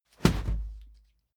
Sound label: whoosh cloth fast hard; swooshes-swishes